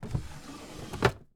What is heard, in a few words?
Drawer Open; HauntedBloodlines; SFX Producer